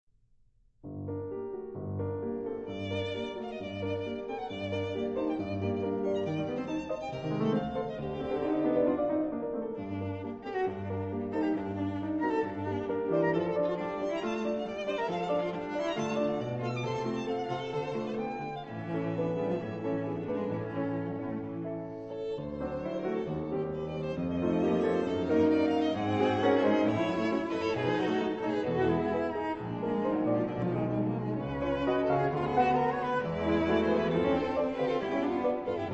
Quatuor piano

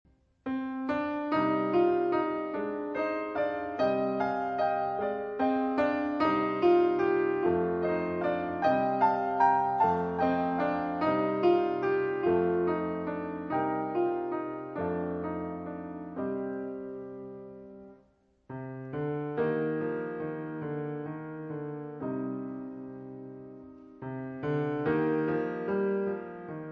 berceuse, piano 4 mains

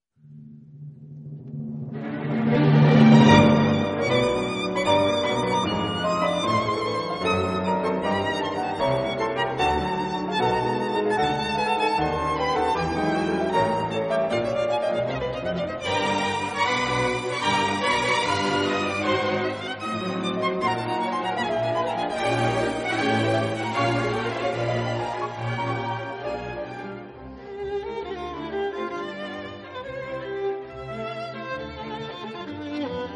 Chants et danses, orchestre

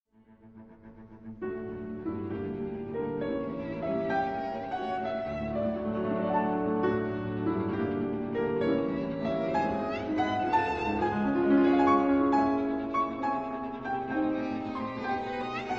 Quintette; Quintette en fa dièse mineur, piano et quatuor à cordes; (Paris : Heugel, 1923); 2. Andante (non troppo lento)

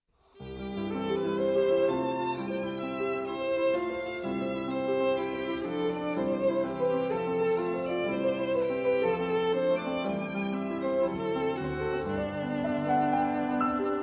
Sonate violon piano; Sonate, violon et piano (Paris : Heugel, 1927); 2. Véloce

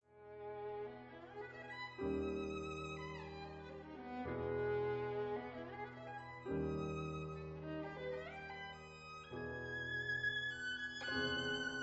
Nocturne violon piano

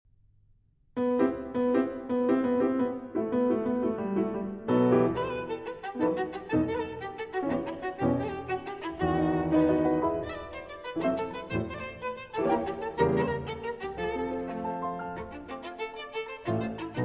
forlane alto piano

